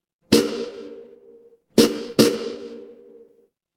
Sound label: fire electric zap